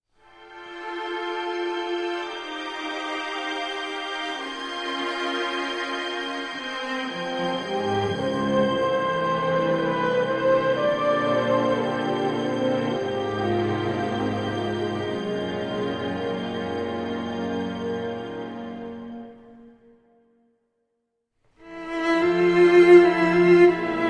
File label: Suite Going Home Cello & Orchestra; 1st Mouvement